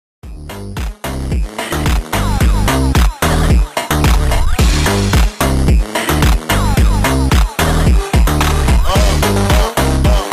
Funk Up LOOP

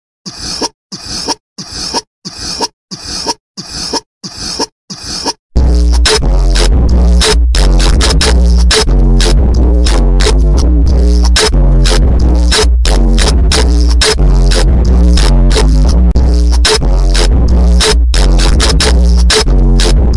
Automotivo slowed loop; Unofficial; fan made

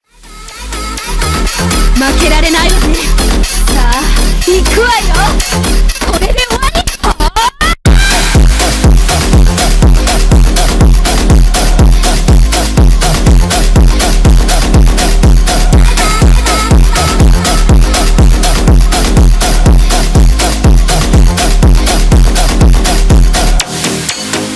Phonk

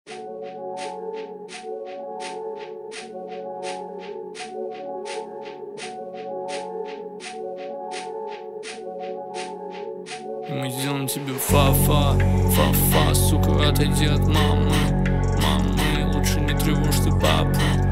White Noise

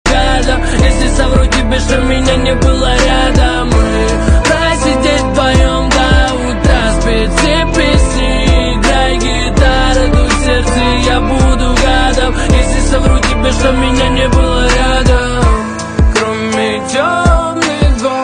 gitara

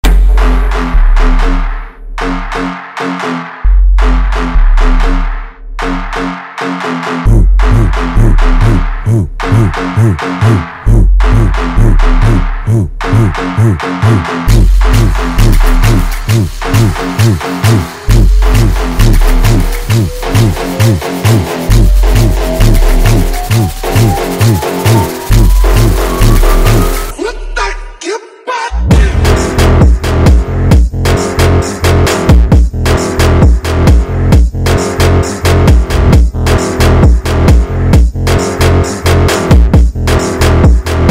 Brazilian Phonk

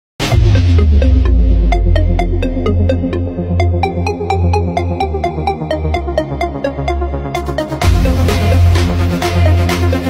DJ Remix

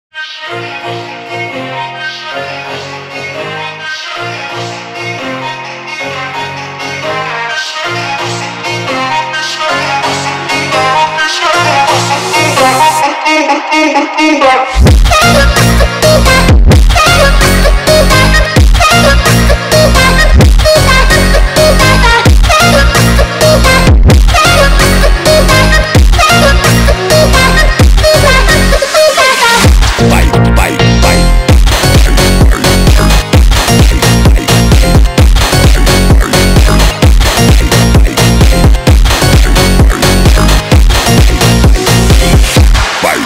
phonk

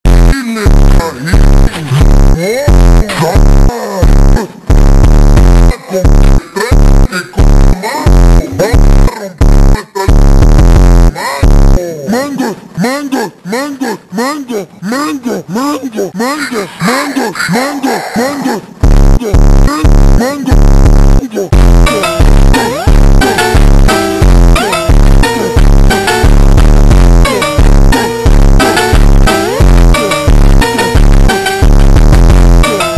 phonk slowed

